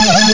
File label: chink vox